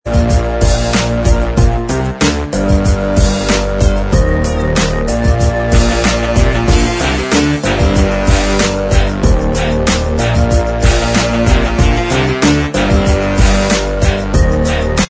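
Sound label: instrumental track, backing tracks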